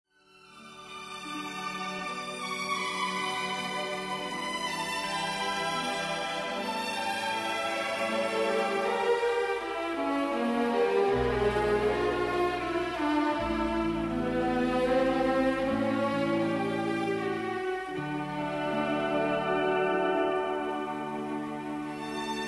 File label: Sweet Violins